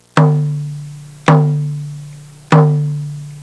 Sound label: indian drum sequence, percussion instruments; Sound Effects for Multimedia - Volume 1